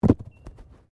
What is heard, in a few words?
Body movement Tumble Medium